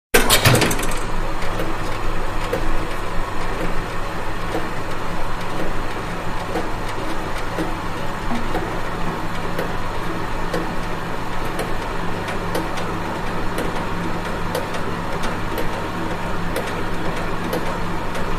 Clock Tower, Clock 3, 18th Century, Ticks; Late 18th Century Clock